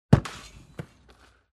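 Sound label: shot into the net and ball bounce; Звуки футбола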